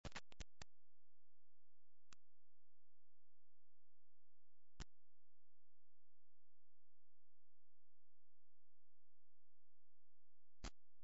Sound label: one long buildup, female